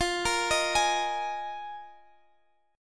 Bb 7th Suspended 4th F, chord

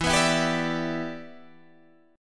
E Minor 7th, down; Em7; strummed

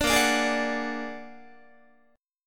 A sharp Augmented 7th A sharp Guitar; A#+7 strummed; JGuitar

